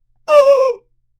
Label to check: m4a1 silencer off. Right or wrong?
Wrong